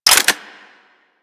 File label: Hand Shotgun Reload Eject Shell, Weapons